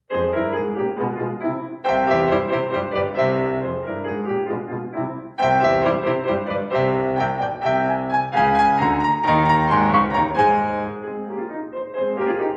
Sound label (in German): Klaviersonate As Dur Allegro molto